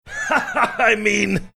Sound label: laughing saying i mean; stugotz